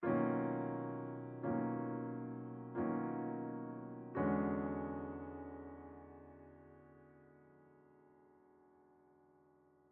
Piano; MuseumBossPiano3; Unsighted

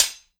Sword Hit Impact; Legend of Keepers

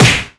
Punch

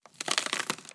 Damned Tourment Skull

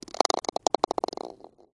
Sfx Golf

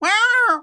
Mushroom Roller Startle

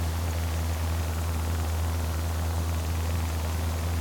Teleport Static-On Rev; Sx Teleport Static-On Rev 01

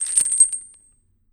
Damned Tourment Chains

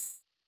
Title Btn Move

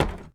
Footstep Plastic Tech

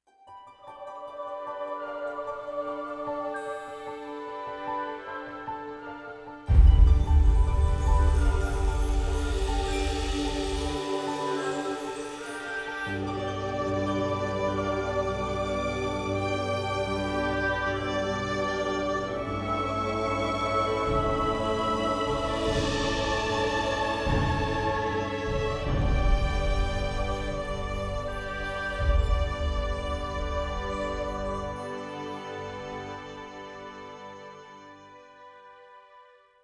forest music

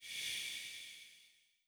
Focus Ready

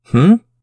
Chorus confuse

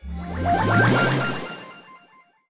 All Stats Up

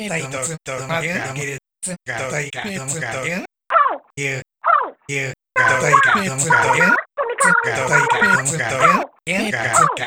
Rap Battle; Splatoon 2 Octo Expansion; Cuttlefish vs Pearl Rap Battle (Variant 1)

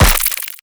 Fly Got Hit; gamerip; Mars Sortie

